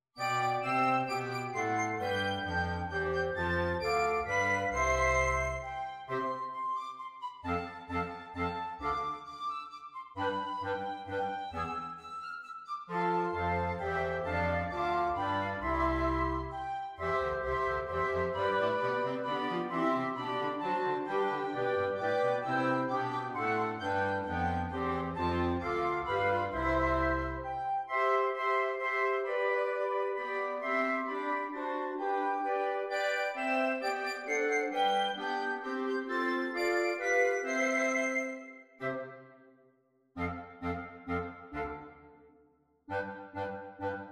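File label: We wish you a merry Christmas accompaniment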